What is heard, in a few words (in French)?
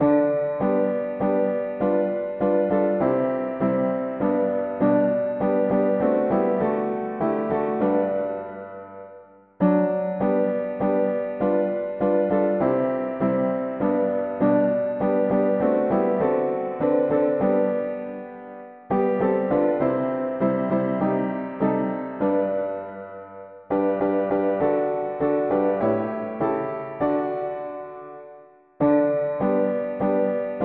Je n ai point honte de l evangile mono piano